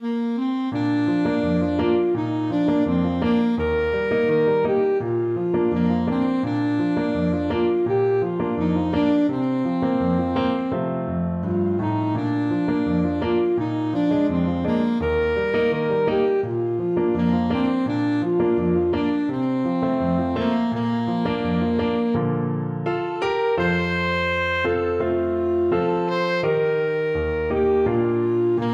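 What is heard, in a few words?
alto saxophone; leaving liverpool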